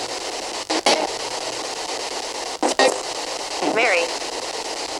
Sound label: ghost radar